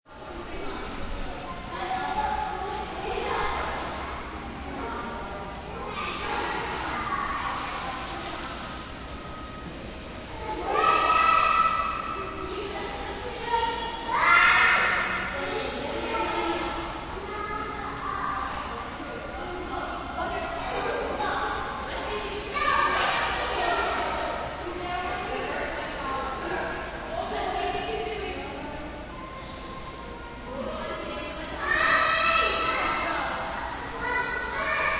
Crowd Indoor Swimming Pool, Children and parents swimming, playing